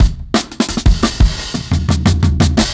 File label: Brown Kit 1-Bar Fill, Drum n Bass; KJ Sawka - Drum n Bass & Beyond; Beats and Percussion